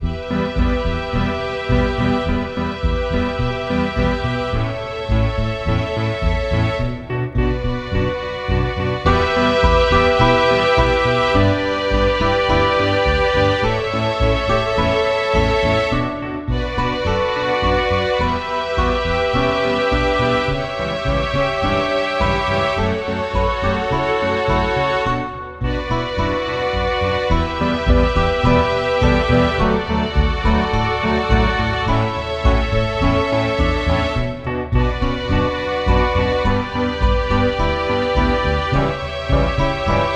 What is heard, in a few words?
royalty free commercial music